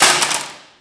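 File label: physics clipboard impact medium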